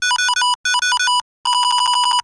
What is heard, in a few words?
Multimedia Internet CD-Rom Flash Digital Toy Beep Electronic Fast